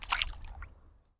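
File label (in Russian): вода короткий активный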